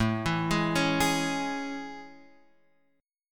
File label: A Major 7th A